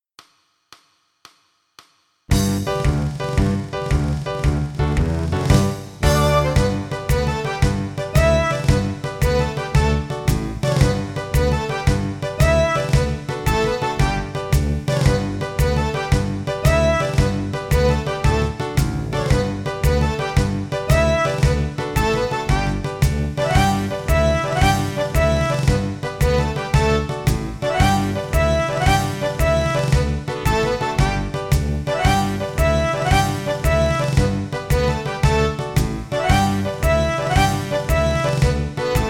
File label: Traditional Irish G, backing track